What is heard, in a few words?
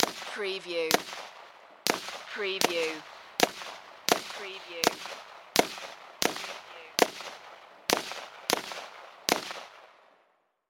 Machine Gun Colt AR-15 Multiple Shots Exterior At 75ft